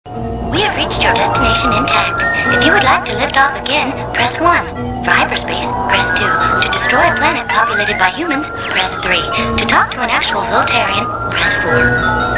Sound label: saucer